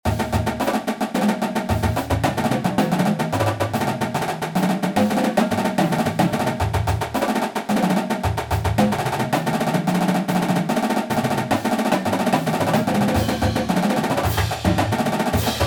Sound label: Rolls